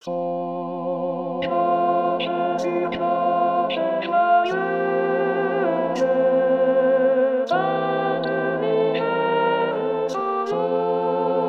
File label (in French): cloches